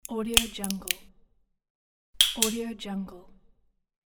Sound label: nut cracking; mrsoundfx